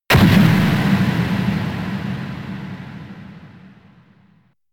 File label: explosion